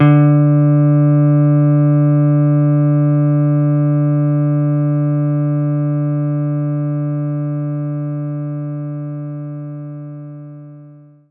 Jazz Guitar D3

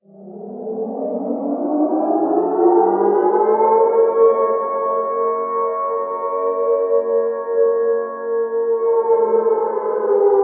Prison Escape Alarm Windup

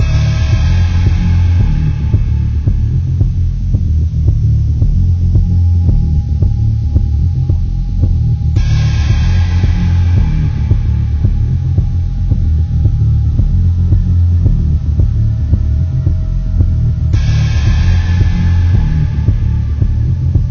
Ambient Loop

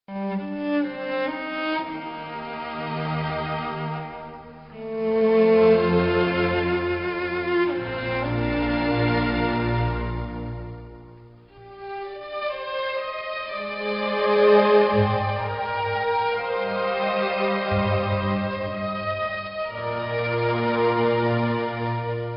Menus Loop; Merlin: A Servant of Two Masters; NDS

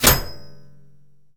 Bullet Impact Metal Light; jasem just another shooter with electronic music windows 2017

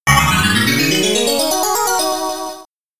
Bonus Game Complete, amiga; Dojo Dan

